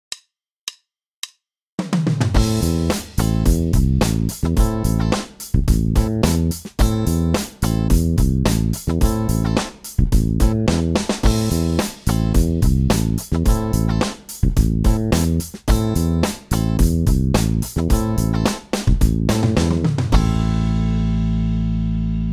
ligne basse accords a 3 notes exercice; exercices

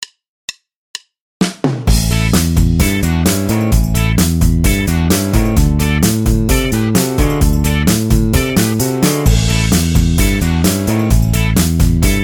main droite exercice, basse; exercices

